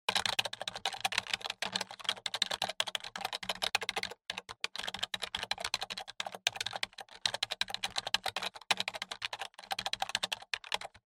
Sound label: PC Keyboard